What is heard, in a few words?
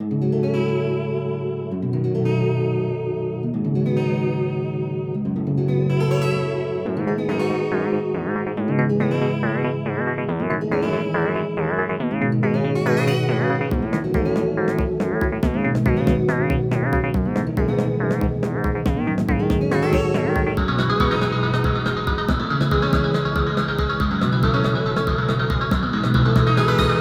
simple beat